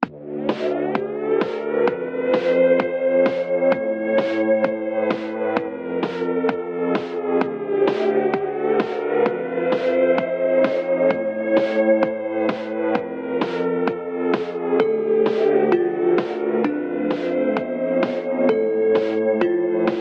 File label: radio; Superstatic; radio secretaction